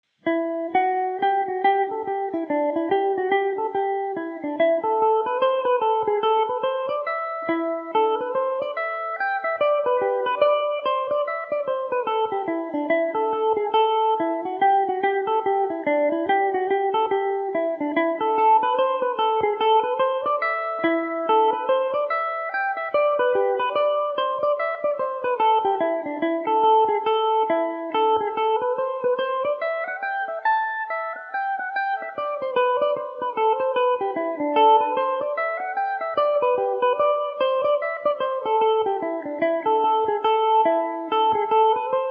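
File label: A Dorian, Irish mandolin; frost and snow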